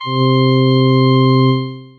Organsmic, P-50 Linear P-50 Structures P-50 Partials; Retro Synths